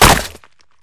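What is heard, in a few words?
Bullet Light Ballistic Concrete impact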